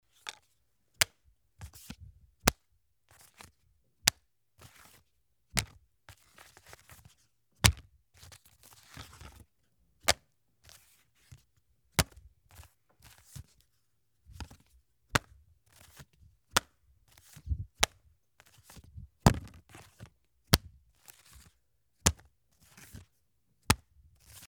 Menko

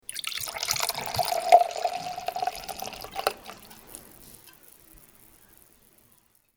wine

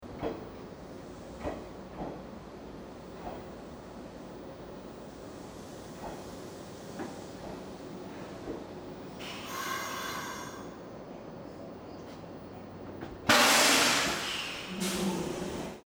train arrival

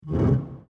school classroom chair

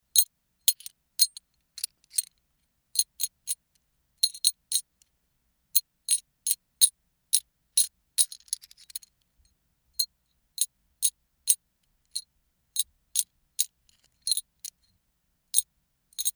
screw

